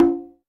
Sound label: Conga Hi Smack; One Shots; Drums; Ableton Live 12 Lite; Core Library